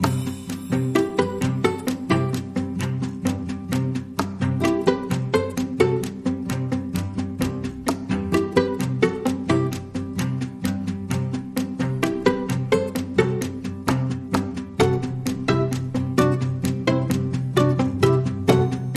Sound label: Clock Is Ticking